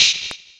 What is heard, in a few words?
Verb, Hit; Sonic Foundry (Sony Creative Software) - Future Retro Dance Excursions